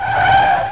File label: skid